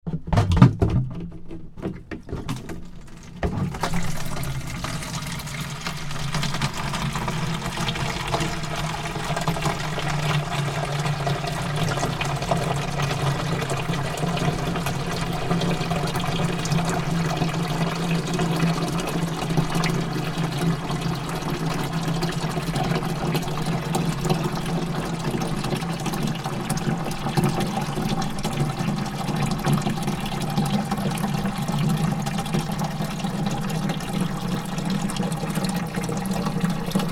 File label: Watering Can Plastic Filling from tap; Clunk of watering can then filling from water butt; Pouring; Tom Hutchings